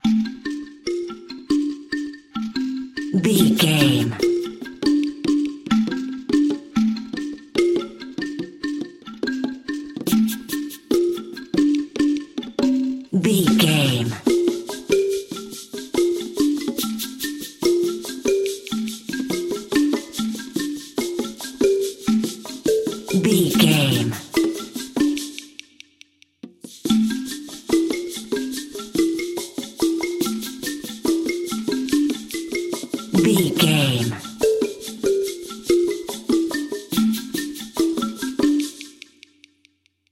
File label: rainforest expedition narrative